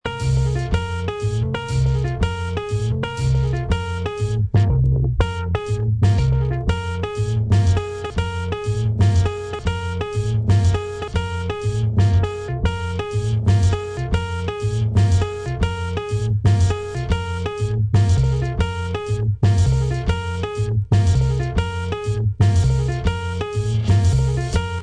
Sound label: piano; MMM 2, 12" vinyl; mastering: robert henke (monolake) at dubplates & mastering